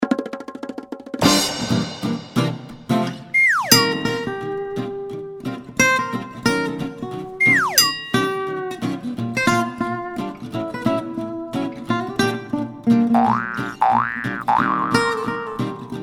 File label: Cheerful Song